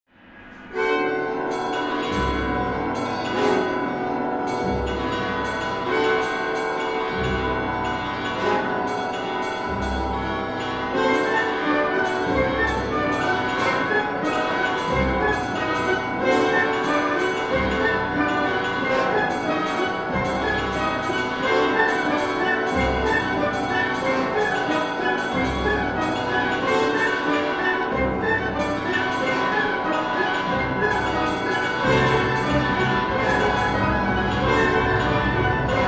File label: Campane Boris; Tedesco